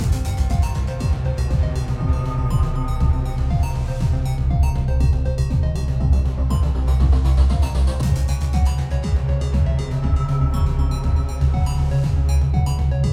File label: Zombie Arcade, fantasy